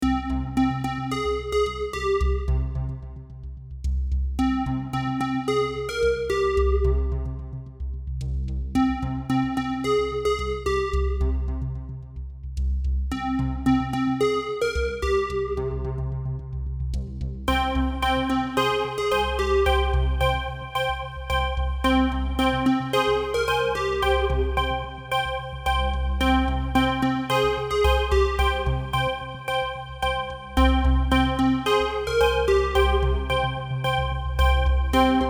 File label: polar plucks